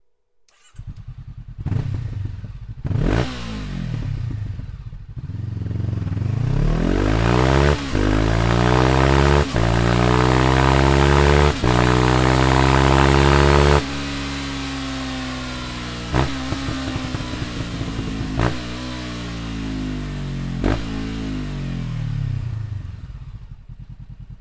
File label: slip on